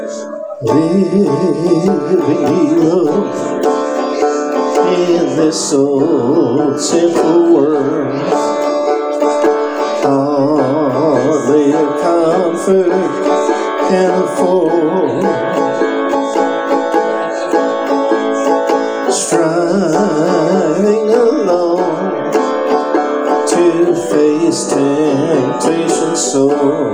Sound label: banjo; live performances by zeb